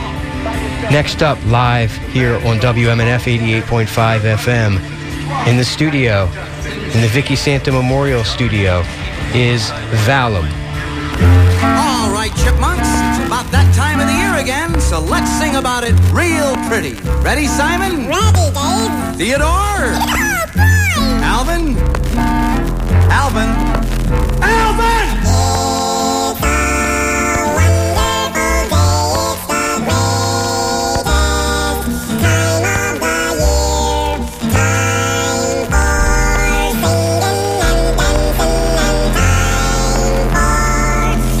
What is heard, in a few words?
live on white noise christmas